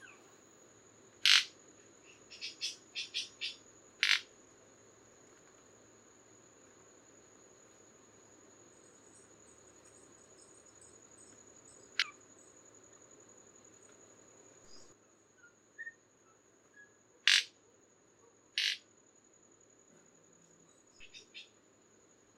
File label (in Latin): manacus candei; saltarines de costa rica; historia natural